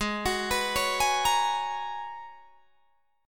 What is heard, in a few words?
G sharp Minor 11th G sharp Guitar Drop D, chord